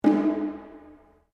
DRUM TAIKO SHIME ROPE F, Small Japanese Taiko drum; Miscellaneous Musical